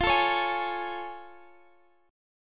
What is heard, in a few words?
A Augmented Major 7th F